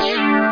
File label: Shield